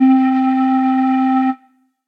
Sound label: Slide Whistle; Instruments; Ableton; Factory Packs; Lost and Found